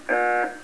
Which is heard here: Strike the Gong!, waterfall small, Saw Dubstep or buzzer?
buzzer